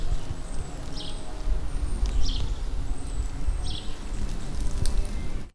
pajaros

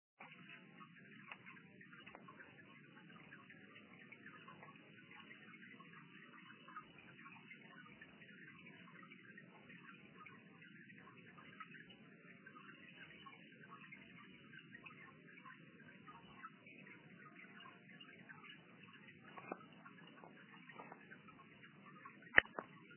gotas de agua en cueva

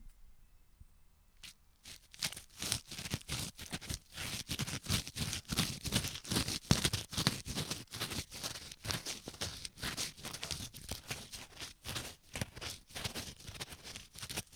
palito sin corteza

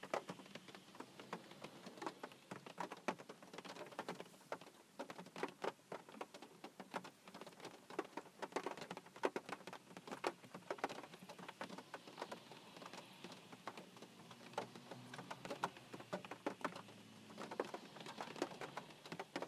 gotas lluvia en techo

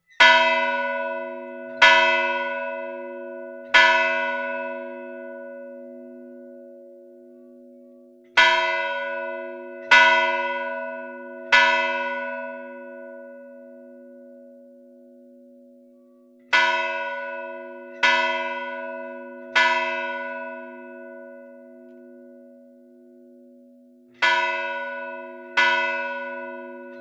Toque de oracion; Tascam

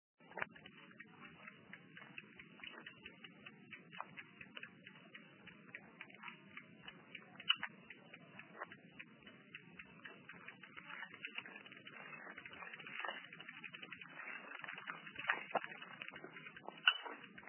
bicicleta

